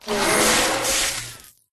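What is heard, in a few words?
pterodactyl boss buf; pterodaktilj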